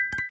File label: Ringtone